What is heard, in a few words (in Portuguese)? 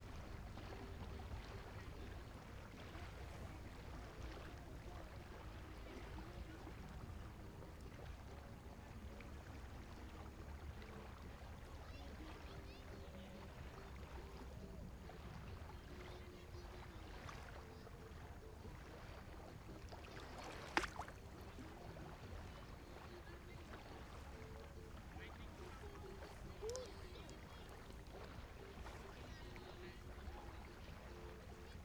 Ambiencia tripe do microfone dentro do lago paranoa em fim de tarde com; musica e cri; Cerrado